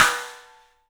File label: STEELS, 3D Drums, ROOM SINGLE